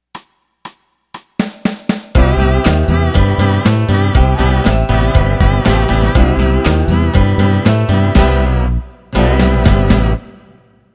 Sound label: Modern Country Intro